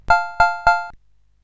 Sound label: sid CSG8580R5, GAMES; Classic Concentration